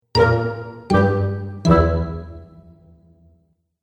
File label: Cartoon Fun Musical Element